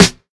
crisp snare a sharp key; snare drum samples

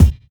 thick bass drum sound e key; kick drum samples

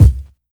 kick drum sample C key

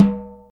mid tom drum single hit g key

